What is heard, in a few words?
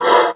PUSTE, BASSOON; Xsample VOL-6